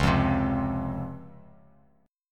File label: Db Major 7th, Bass Standard; strummed; chord shape; x,4,3,5; JGuitar